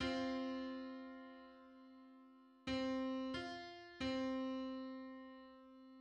Eighty-fifth harmonic on C

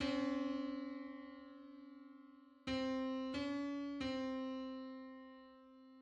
Five-hundred-fifty-fifth harmonic on C

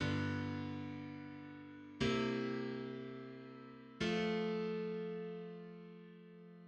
Barbershop secondary dominant

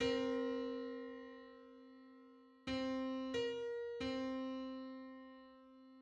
Nine-hundred-twenty-fifth harmonic on C

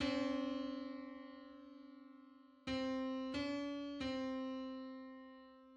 Two-hundred-seventy-ninth harmonic on C; MIDI

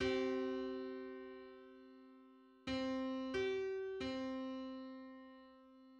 Three hundred seventy fifth harmonic on C

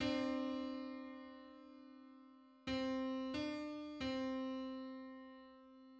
Five-hundred-eighty-fifth harmonic on C

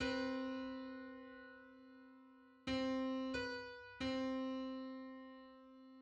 Nine-hundred-fifty-seventh harmonic on C

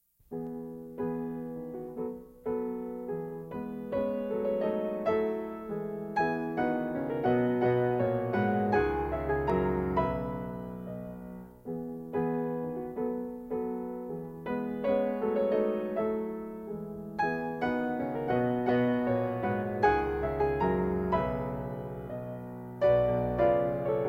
waltz; Brahms

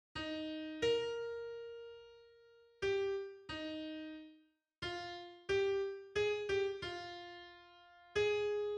leitmotif; Siegfried

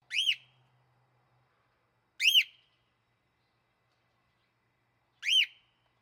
Nymphicus hollandicus call

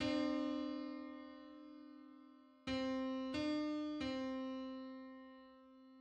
Five-hundred-eighty-ninth harmonic on C

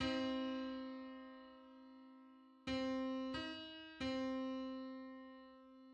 Six-hundred-twenty-first harmonic on C

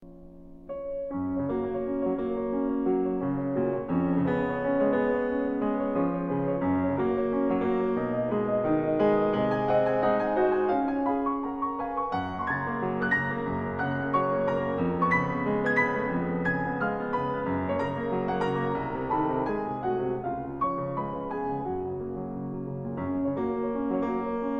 Schubert Impromptu B-flat

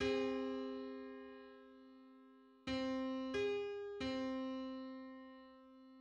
Seven hundred ninety fifth harmonic on C

